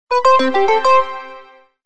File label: alert ringtone